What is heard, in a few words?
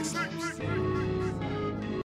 Melody